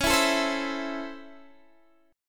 C sharp Minor Major 7th Sharp 5th C sharp Guitar Open D, chord